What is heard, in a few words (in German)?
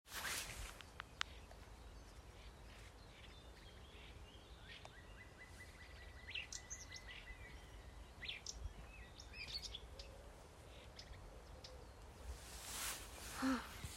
Vogelgezwitscher im Wald